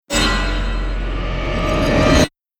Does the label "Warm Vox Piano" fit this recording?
No